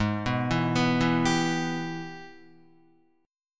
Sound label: Ab Minor Major 7th Sharp 5th, down, Guitar; arpeggiated; JGuitar